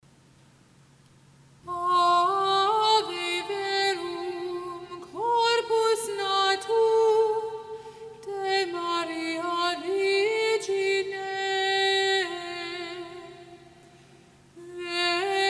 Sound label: Ave Verum Gregorian Chant